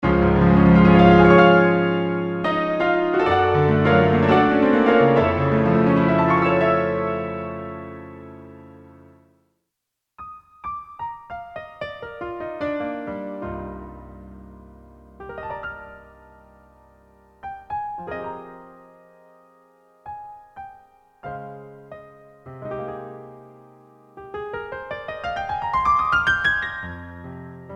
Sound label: Roland JV; roland-cartessons